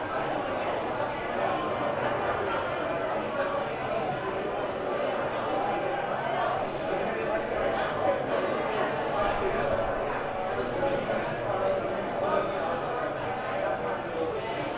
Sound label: Cake Deco Crowd; Orleans; Cake Decorate